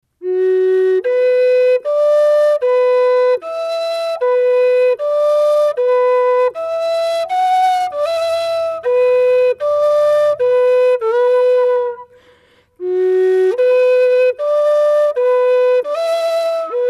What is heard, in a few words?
low d slow